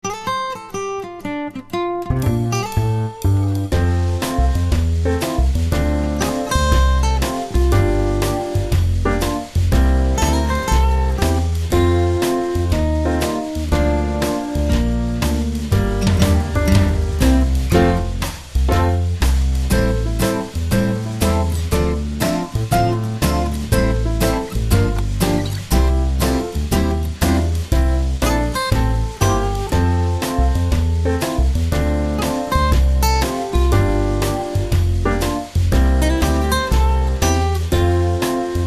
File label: Im Not Talking To You Backing